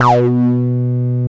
303 B2; Jack Sparrow; Deep Dubstep Progressions

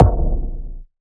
Kick

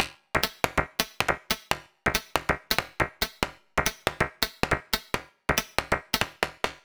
140 bpm Perc Loop, Dubstep

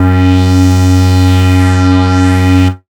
Bass Shot F#; Mutekki Media; Vengeance Electroshock Vol.2

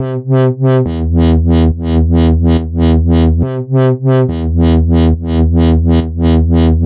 140 E BASSLINE, Dubstep

